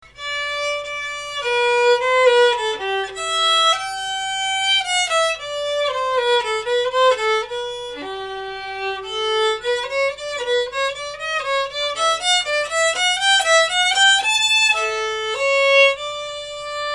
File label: bourree, violin; adult violin